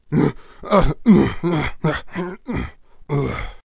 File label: Traveller Attack Vocals